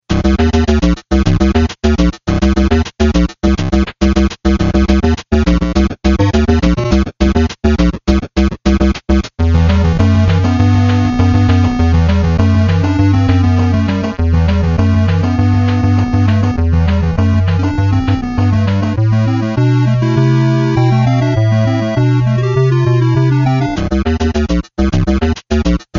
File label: Level Music; Electrocop